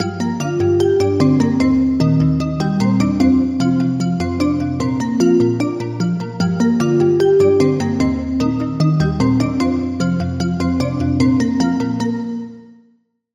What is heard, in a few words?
Bell; Routed Sound; Serum 2 Expansion - Story Walker Vol.1